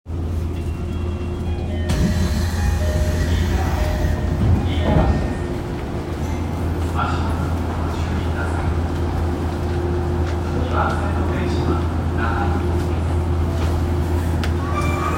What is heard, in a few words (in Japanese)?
東西線飯田橋西